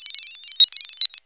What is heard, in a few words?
Sparkle